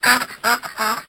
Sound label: Duck; peace death 2017 pc